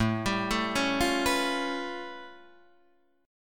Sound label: A Augmented 9th A Guitar Open D